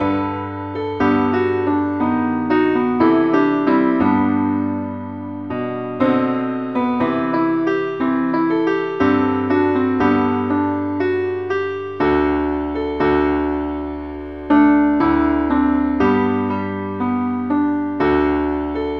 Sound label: keyboard Only key C